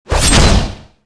zombie wall, claw; tayo escape

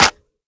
Equip Stock Lock, weapons; stinger

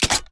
Reload Top Open Start, weapons; odin

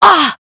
pain; jett

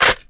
magin end, weapons; vandal

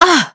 pain; deadlock

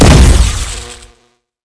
deadlock barrier removed

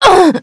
death; fade

